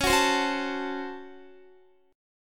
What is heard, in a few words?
Db Major 7th Suspended 4th Sharp 5th, Guitar; x,16,18,17,18,17; 4F,4A,5C,5G,5C,6E; strummed; chord shape